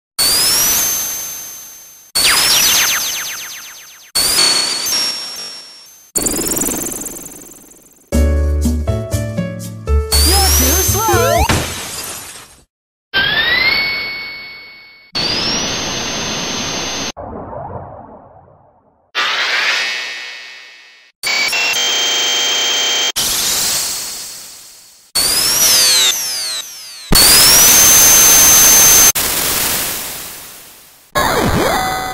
Spin Sound Effects; Sonic the Hedgehog

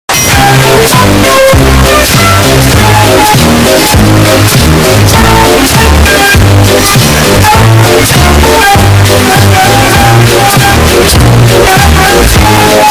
theme song loud version; meme sound

